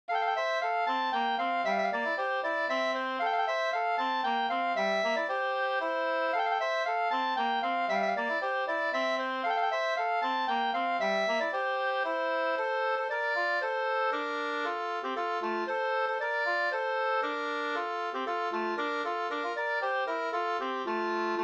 slängpolska

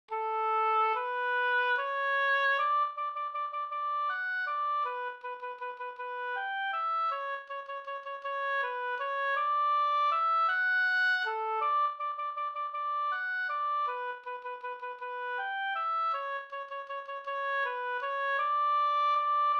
folkdans; Ankemannavalsen Bh

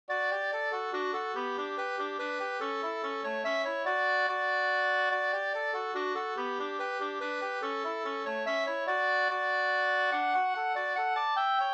hambopolkett; e Bror Andersson Sd 2st

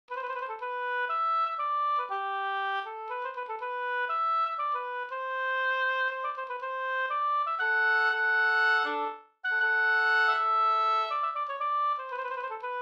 polska Bodapolska

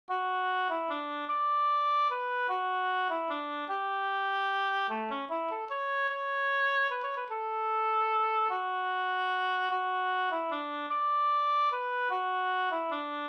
vals Brudvals

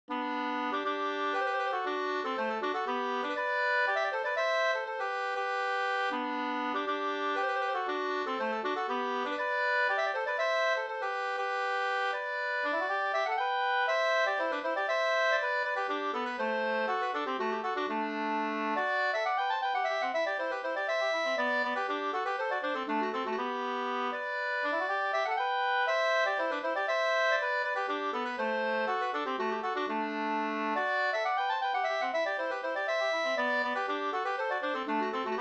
polska Bingsjopolska; Martamesgubben e Pekkos Helmer 2st

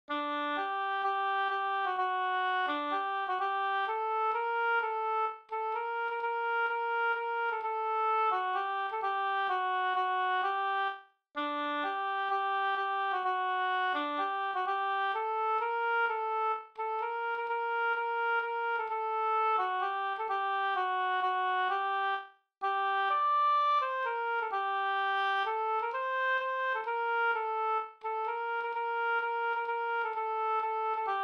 polska vispolska; fr Sd Rosenberg

